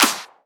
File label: Shifted Clap, Claps; FLPRO